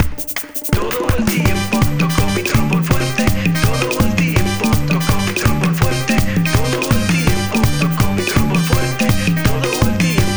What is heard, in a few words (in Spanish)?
Trombon Fuerte; Trombone Champ